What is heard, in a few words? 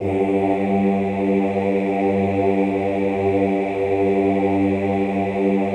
OOH G1, Classical Choir; Best Service; Extended Classical Choir